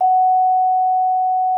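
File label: vibraphone F#4; Xsample VOL-4; VIBES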